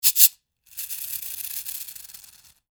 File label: shaker